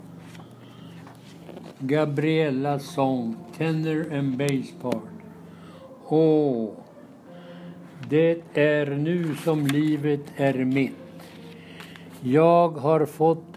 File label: Tenor Bass, chorus